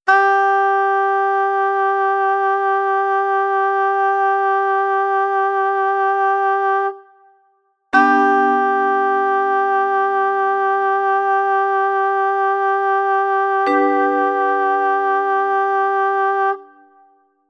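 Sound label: soprano g vibrato, sax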